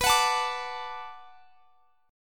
B Minor 7th B, chord; Bm7 strummed

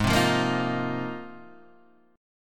Ab 6th Add 9th down Guitar Open G

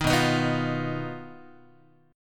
B Major Flat 5th B down Guitar; BMb5; strummed

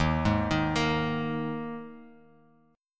Eb Suspended 4th Eb Bass Standard; Ebsus4 arpeggiated; JGuitar

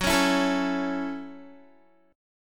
F sharp Minor 7th F sharp, Guitar; strummed

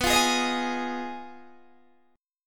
B 6th Flat 5th B down Guitar DADGAD

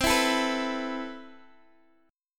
C Major 7th Add 6th C; Cmaj7add6 Chord {X X 10 9 10 7} strummed

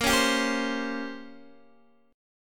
A sharp Minor 6th Add 9th, Guitar, Open D

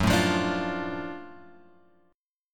F sharp Minor Major 7th Sharp 5th; F#mM7#5 Chord {2 0 3 X 3 1} strummed

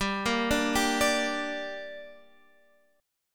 G Minor G, chord

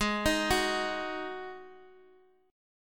Eb Minor Double Flat 5th Ab; Ebmbb5/Ab arpeggiated

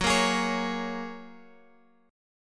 Gb Major Add 9th, down, Guitar, Open G

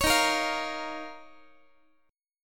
Eb 7th Suspended 2nd, Guitar; x,x,16,13,14,16; Eb7sus2 strummed; JGuitar

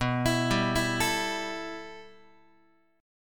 B 7th Suspended 4th Sharp 5th B down Guitar; B7sus4#5; arpeggiated; JGuitar

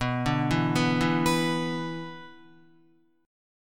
B-Minor Double Flat 5th, Guitar; Bmbb5 arpeggiated; JGuitar

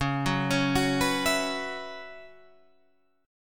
B Suspended 2nd Suspended 4th Db

